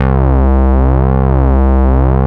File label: PWM, HARDCORE; CMP - Analogon